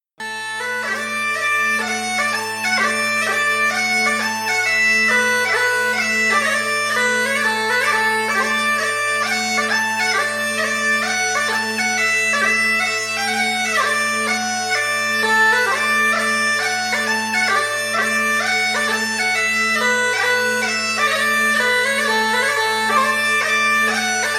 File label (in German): Black Bear, Dudelsack